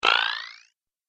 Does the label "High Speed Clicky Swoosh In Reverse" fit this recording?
Yes